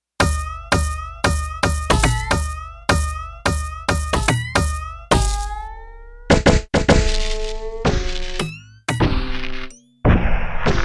Gated FX

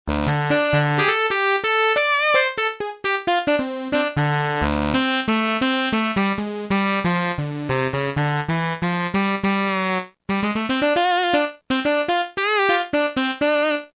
Saxy Jam; Roland M-DC1

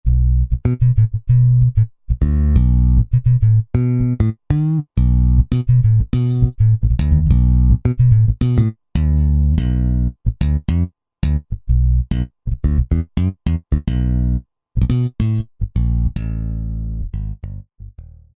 Abe Sr. 4way; Roland SR-JV80-10 Bass & Drums

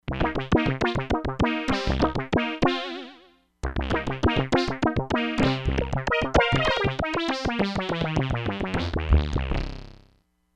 FUNK TOUCH, Crumar Bit 99; Factory Programs

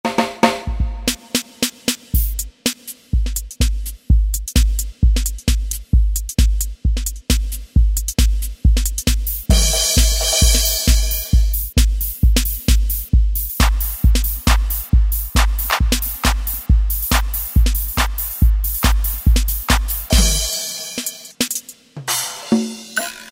House Kit; Generalmusic S2R

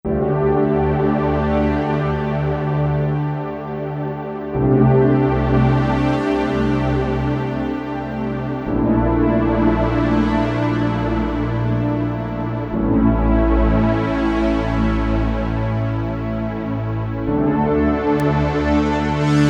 Warm Saw

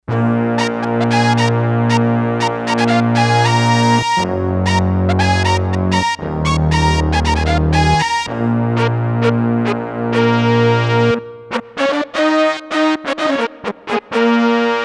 Fighting Brass, Roland D-10; Factory Patches

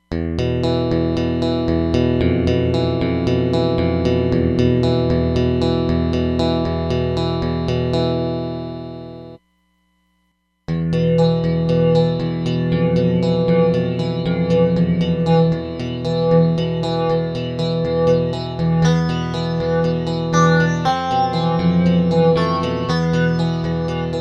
Flange, Roland DEP-5; Factory Patches

